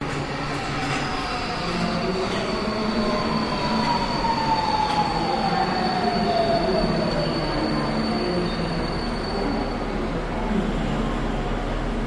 Metrostation Soundscape Metro Arrives Announcements In Distance; Budapest Hungary